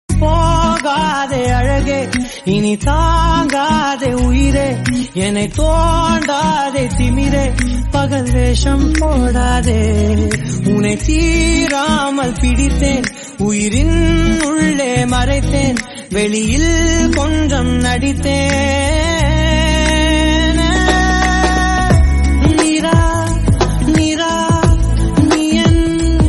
Tamil

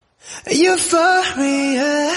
Notification Tone

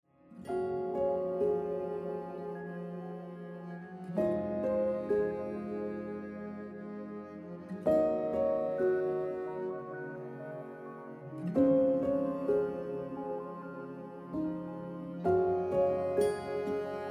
Piano Music

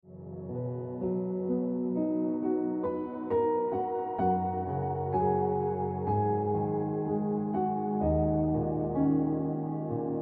Piano Music

